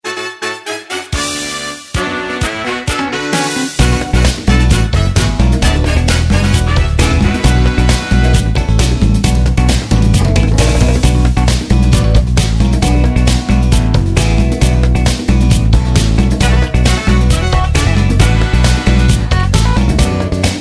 AFRICAN BRASS SECTION

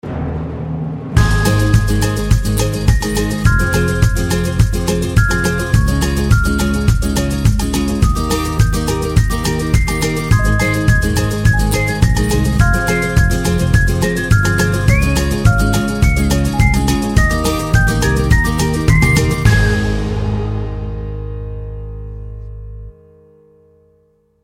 ACCOUSTIC

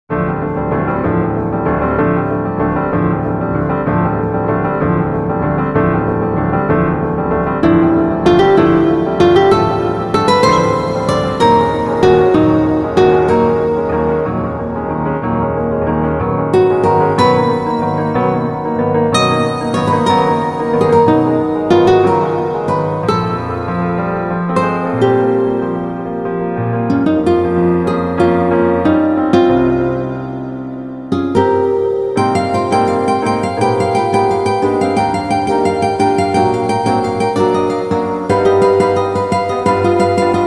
BLUE GRASS GUITARS

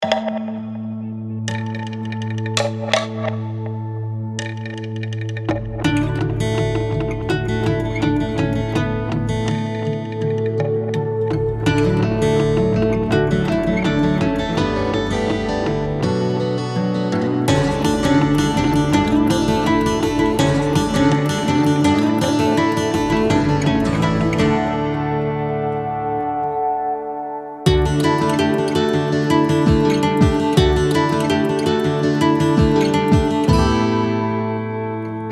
IRON AND WOOD

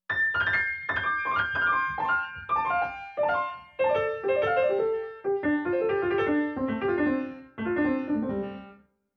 ESSAIS PIANO

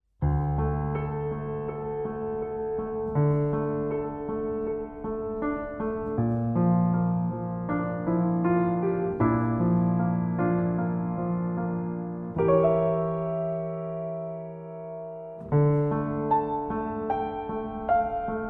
PIANO OF STONE

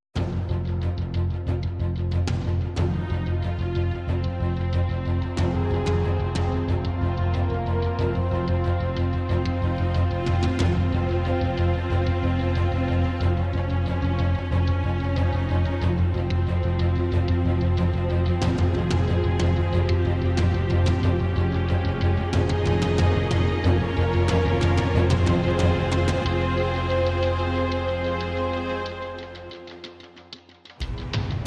SERIE ACTION OCEAN ATTACK

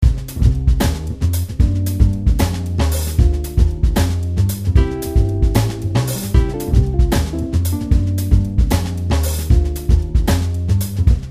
SESSION BASSDRUM

